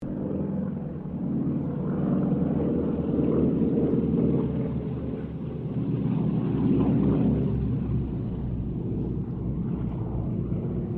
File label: AIRCRAFT WINJEEL SQUADRON 2 PASS, 2 Australian Winjeel Trainers; Airplanes; Vehicles